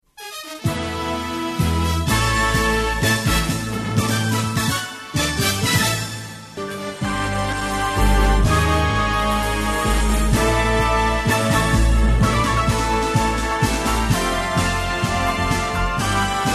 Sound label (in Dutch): Radiojournaal jingles; 1992 AVRO